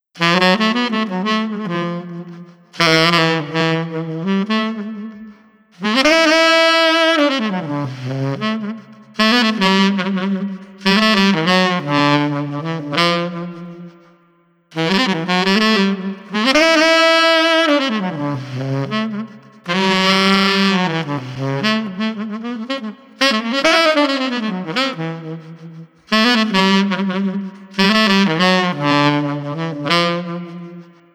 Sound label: Sax Pensive 70